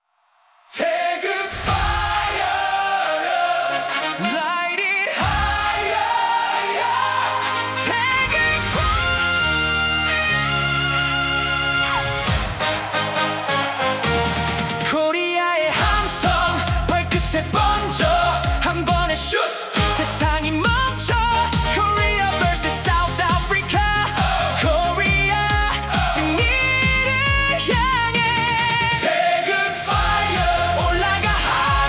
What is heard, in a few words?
Energetic; Korea vs South Africa